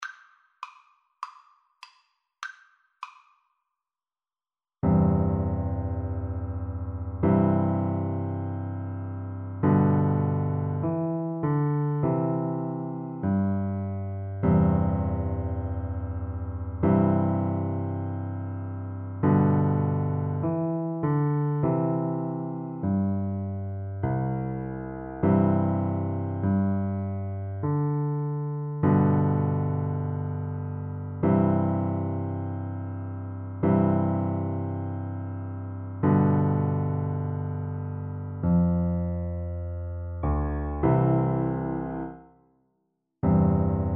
piano duet

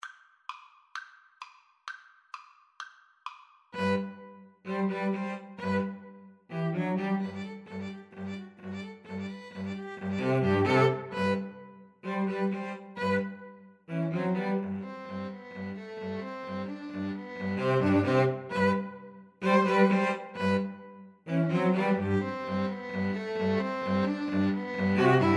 nutcracker trepak, 2 Violins Cello

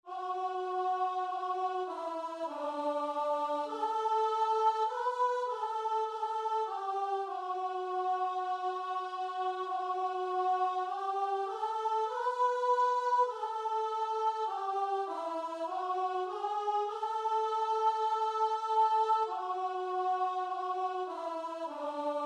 abide with me, guitar and vocal

